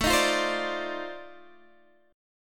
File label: F 13th A, chord